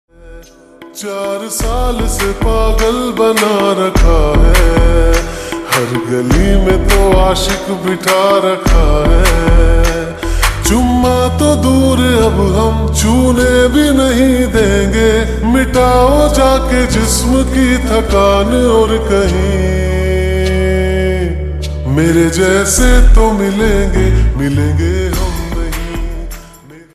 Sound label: Slowed Reverb Ringtone